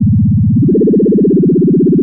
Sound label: sci fi; Masterbits - Switch FX